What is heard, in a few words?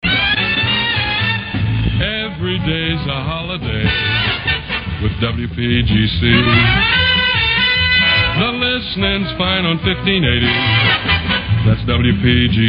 jingles; pams series 9; highland; everydays a holiday